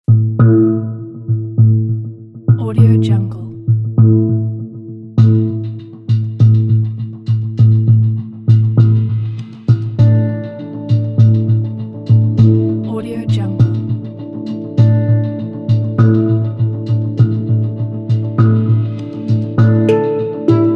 mysterious tense hang drum ambience

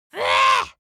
creature dwarf disgusted

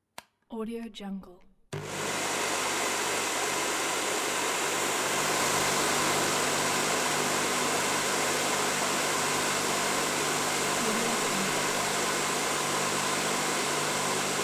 hair dryer

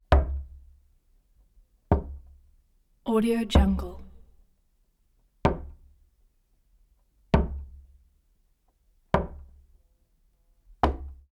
door knock hotel room door wood single impacts

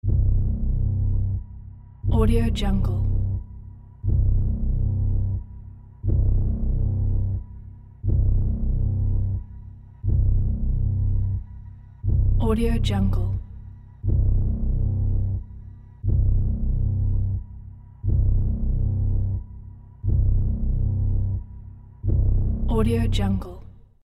low pulse drone

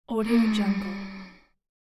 satisfied sigh from woman

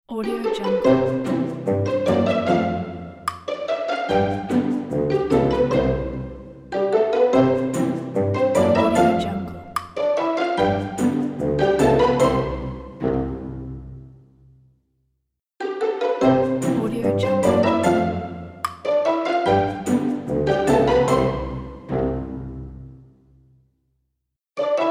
pizzicato comedy logo

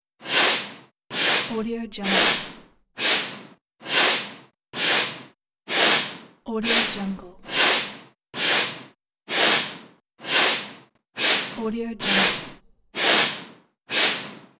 broom sweeping

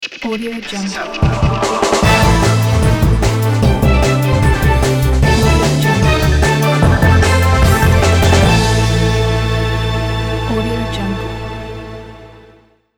action modern orchestra beat logo

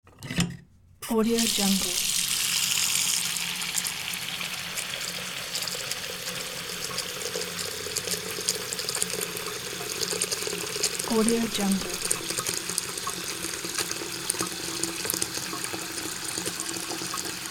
faucet turned on and bathroom sink filled

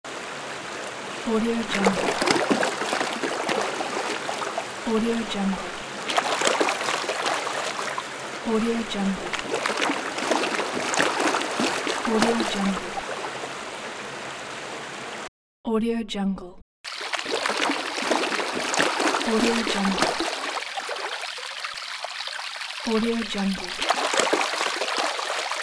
swim in the river

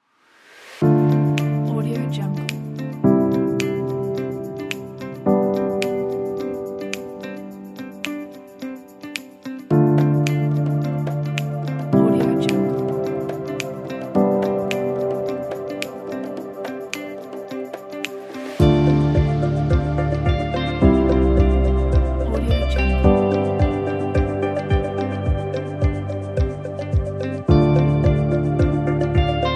the ambient music

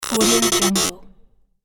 glitched production element

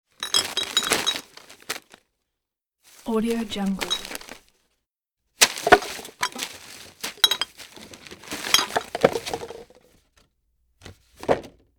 trash bursts of movement plastic bag grabbing

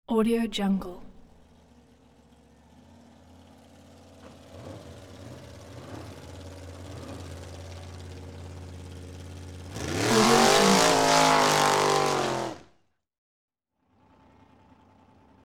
dragster burnout

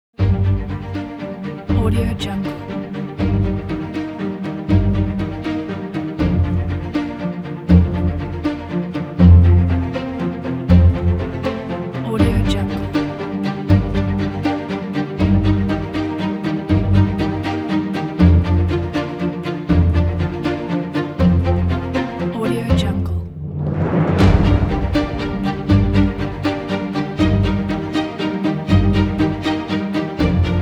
chamber motivational playful strings; music2noise

